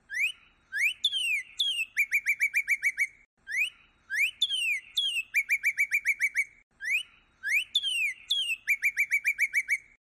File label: cardinal, bird song; cardinal code three; Sherry Davis